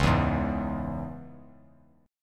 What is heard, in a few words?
Db Minor, Bass Standard; strummed; JGuitar